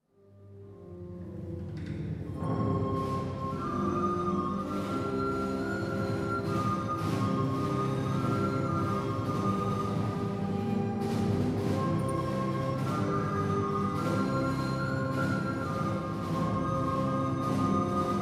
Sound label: trumpet